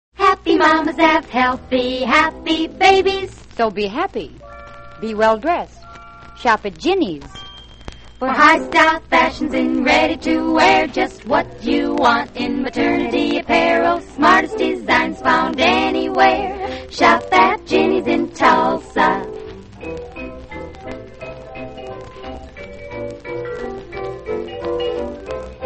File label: radio jingles; jinnys maternity; allied radio artists